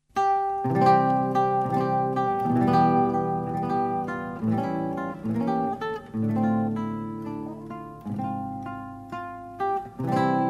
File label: hot swing